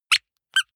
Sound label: squeaker squeeze with release hard